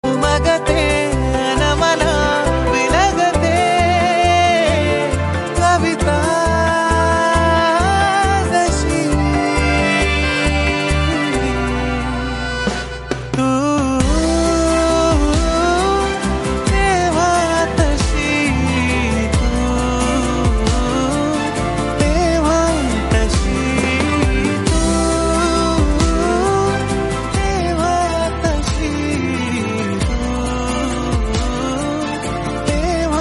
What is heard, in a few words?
marathi serial song; zee marathi